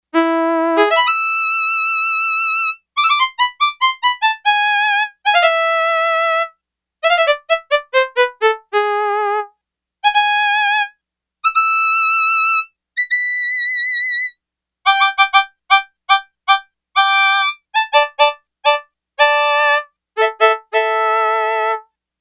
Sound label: Violin, Yamaha DX11; Factory Preset Voices